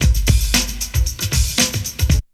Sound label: break; Zero G - Man Machine